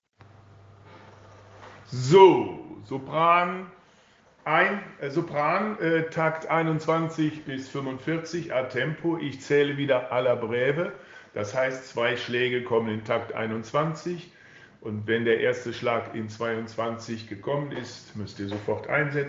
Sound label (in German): BN Sopran atempo